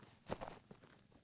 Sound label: step grass, footsteps